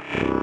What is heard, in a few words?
Grid Fragment E 140 BPM, Psytrance; Xenon; Grid Loops